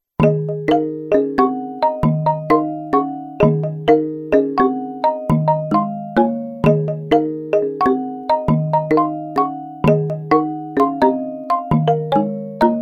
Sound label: Nu Bambu Dry